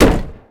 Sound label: wood hollow, Impact; Cars; XBOX